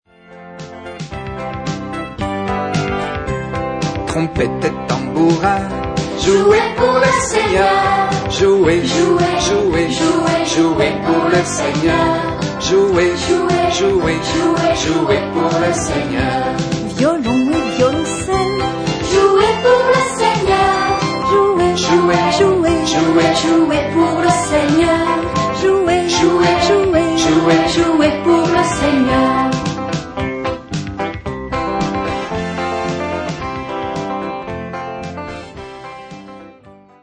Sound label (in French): trompettes et tambourins